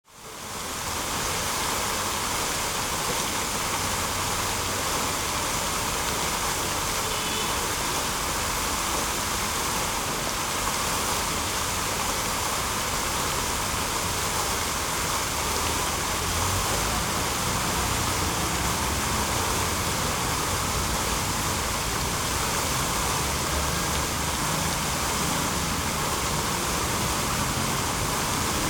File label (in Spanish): plazoleta; santa fe de la vera cruz; blandengues